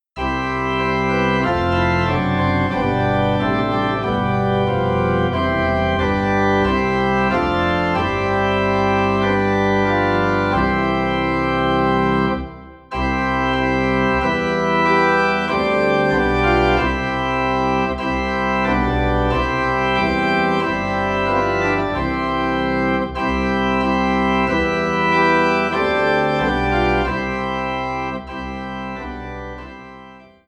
Angels We Have Heard On High - Organ Low in C; christmas

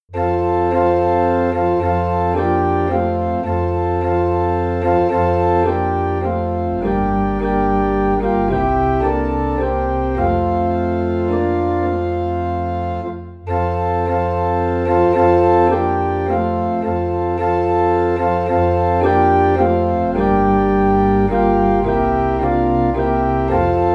Organ in F

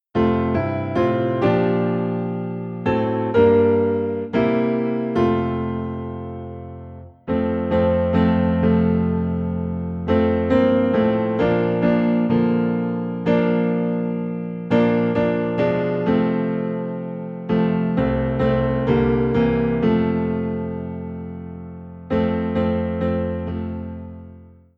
Piano - Low in F

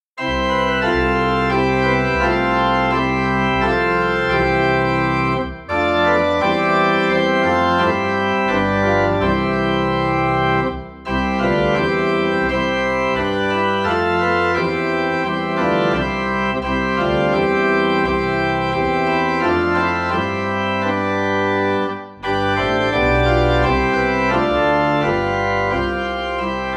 Organ in C; While Shepherds Watched Their Flocks; christmas

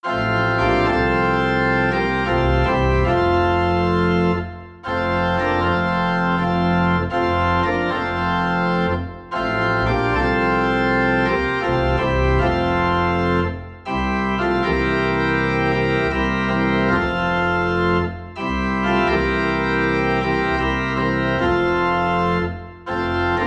Organ Low in F; Rock of Ages

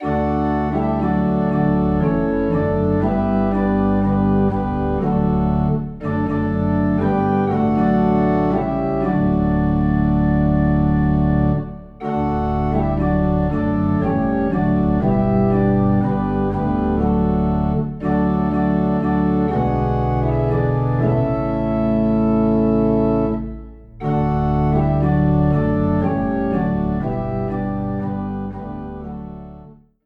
Organ Low in D; Softly and Tenderly

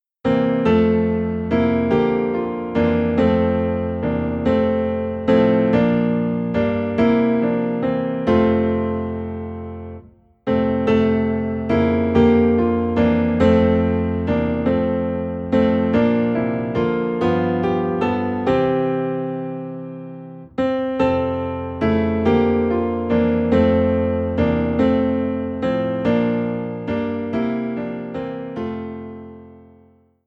Piano Low in F

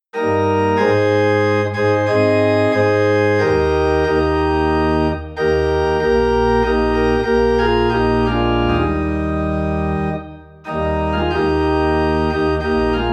Organ in E Flat; O Day of Rest and Gladness

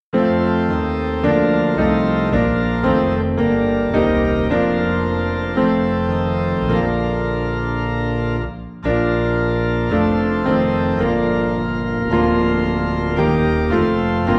Piano and Organ Low in B Flat; Abide With Me

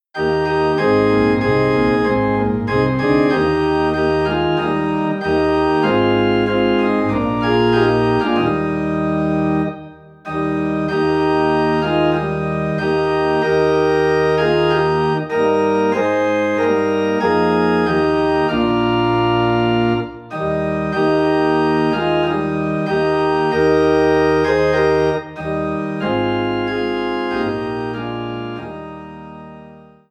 Organ in E Flat; At the Cross

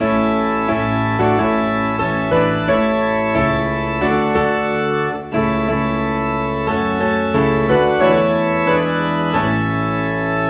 Piano and Organ in A; Will Jesus Find us Watching

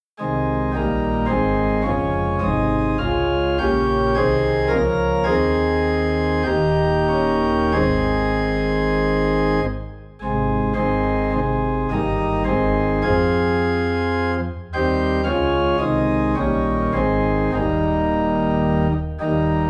Organ Low in A Flat; Rejoice the Lord is King

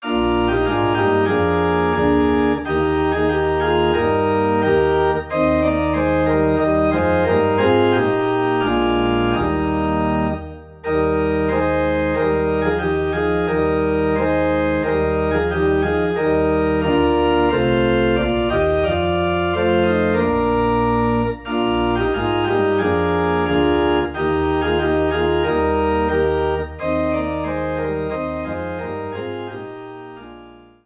Organ in E Flat; O Thou Joyful O Thou Wonderful; christmas

